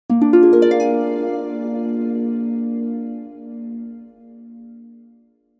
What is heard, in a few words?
harp glissando up F; orchestra-effects